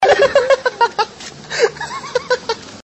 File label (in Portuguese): risada maromba